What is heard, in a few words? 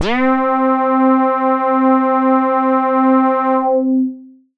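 lead C4, ARP 2600; Polyend; Simulacrum Sounds; 1 Shots; Synths; Keys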